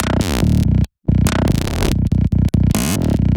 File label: Industry Grid Loop B 142 BPM, Psytrance; Grid Loops